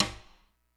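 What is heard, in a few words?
snare drum, orchestra percussion; Greytsounds VOL-1; ORCH PERC 3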